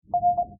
computer beep

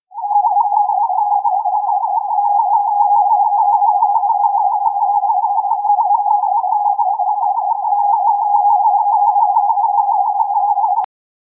medical scanner handheld; TOS

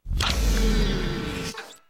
door

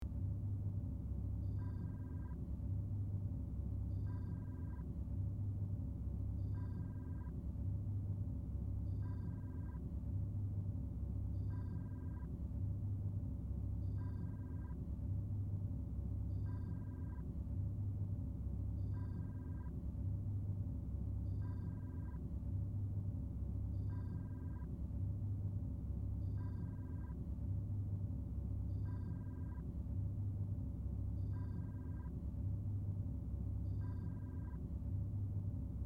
astrometrics, background; VOY

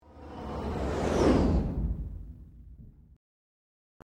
warp out

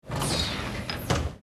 alien door

